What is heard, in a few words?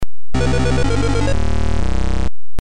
game over